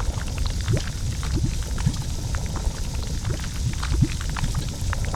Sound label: Bubble; MiSide